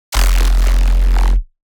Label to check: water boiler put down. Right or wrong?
Wrong